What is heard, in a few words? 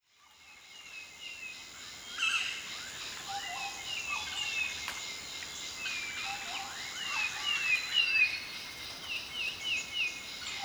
Cloudforest Malaysia